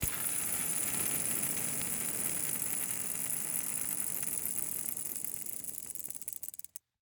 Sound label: Roller skates, old fashioned, all metal, wooden wheels, swipe, spinning, slow to stop; 2 mics; focus wide; Eiravaein Works; Vaeyan IV